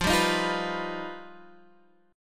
G Augmented 7th

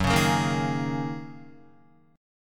Gb 6th Add 9th Gb down Guitar

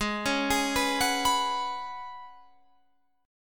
F sharp Suspended 2nd Suspended 4th G sharp

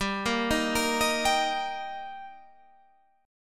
G Minor Sharp 5th G, Guitar, Standard; arpeggiated; 15,13,13,15,16,15; JGuitar

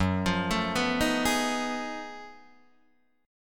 Gb Minor Major 13th Gb down Guitar DADGAD; arpeggiated

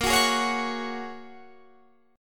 A sharp Minor 7th Sharp 5th, Guitar, Standard; A#m7#5; strummed; 18,21,18,18,19,21; down; capo=9

